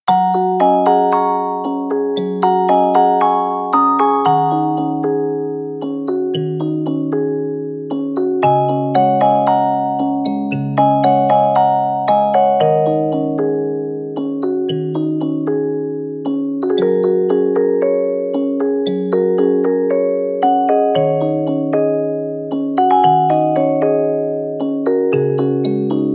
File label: Music Box